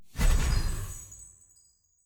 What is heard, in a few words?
Transmute